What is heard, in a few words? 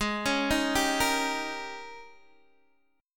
Ab Major 7th Suspended 2nd Suspended 4th Ab Guitar DADGAD